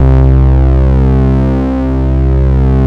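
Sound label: MOOG MODULAR C2; Hollywood Edge - Giorgio Moroder Rare Synthesizer Collection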